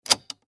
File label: Cassette Player Stop; Technology; Tape Player; Electronics